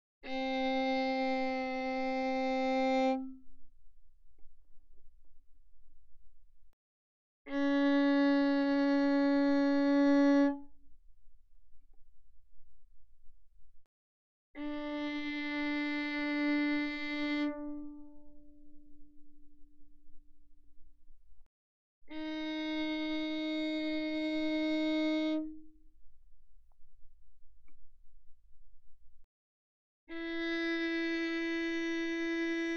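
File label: Violin arco mezzo forte sul G C4 B5; Strings; violin2012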